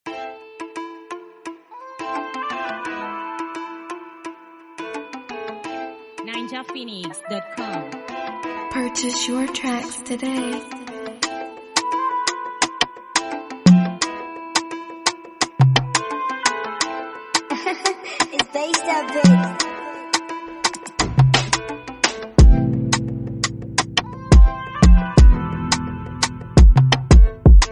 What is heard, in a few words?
Afrobeat Instrumental